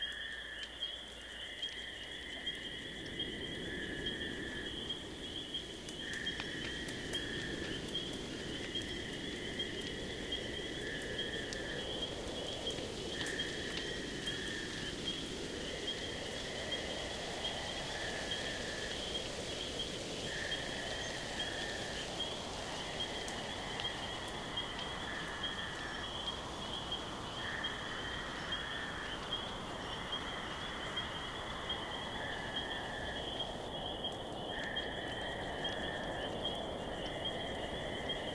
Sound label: ufo night atm